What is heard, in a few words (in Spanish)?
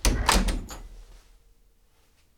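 CERRAR PUERTA INSIDE, close door inside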